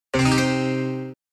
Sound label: Save Jingle; Dillon's Rolling Western; Nintendo 3DS